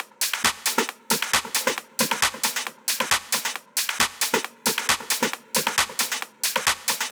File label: 135BPM Loop, Drums & Perc; F#minor